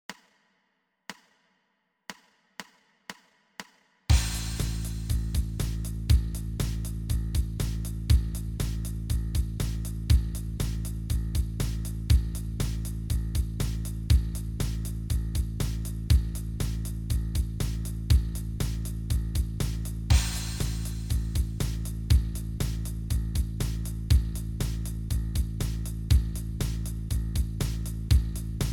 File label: bass startup open a 120